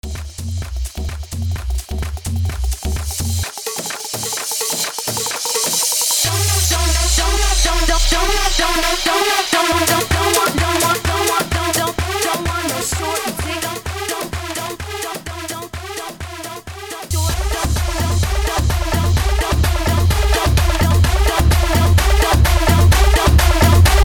Sandungueo 128 BPM

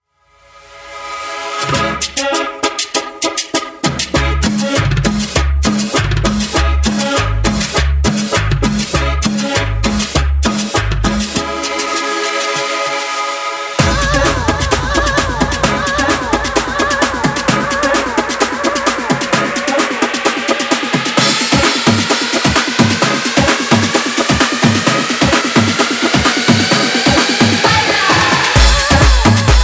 cumbia guaracha remix 130 bpm

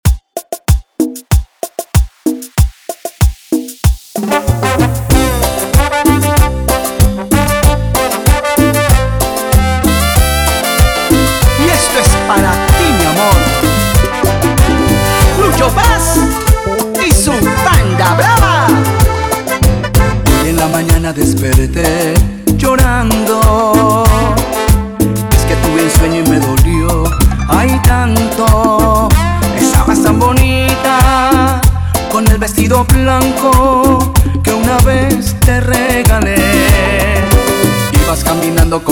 95 bpm

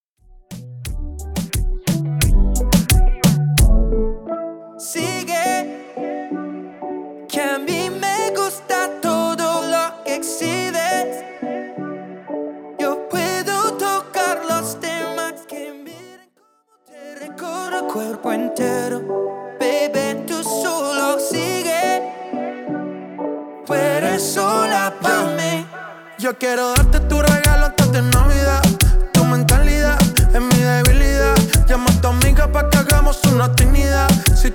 intro outro bpm 88

